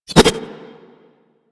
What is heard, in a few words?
Tonfa Parry Whoosh; Warframe; Tenno